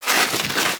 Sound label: Armadillo Roll Snow; Warframe; Characters; Hunting Critters